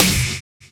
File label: Kick, Drum & Bass; Kicks; AMG - Prototype Drum & Bass